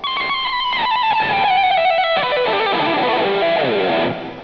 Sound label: Fast Picking, Guitar